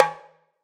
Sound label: Udu Skin Pressed